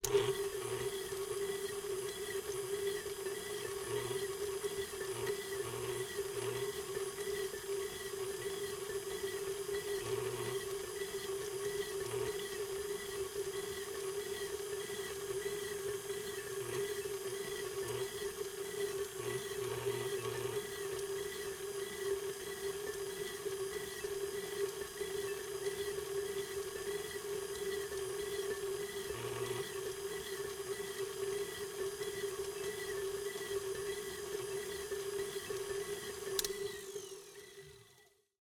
Stand mixer, professional, multi speed, bowl, speed 2; Eiravaein Works; Vaeyan IV